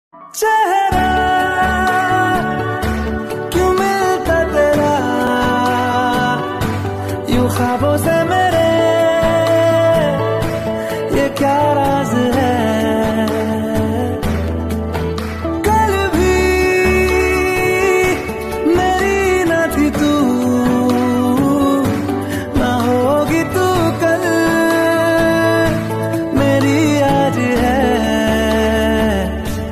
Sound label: song ringtone